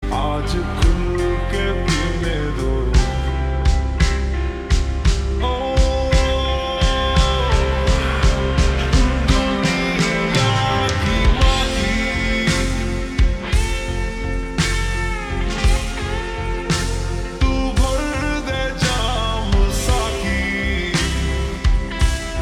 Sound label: Ringtone Slowed Reverb